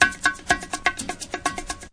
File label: 125 compressed conga line, drum loops and breaks